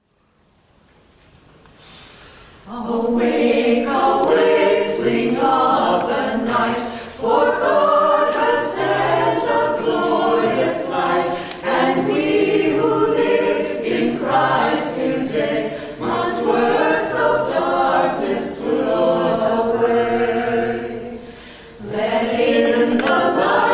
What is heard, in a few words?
choir; advent music